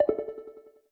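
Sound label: Quick Stop Click Sound; starburstxxxtreme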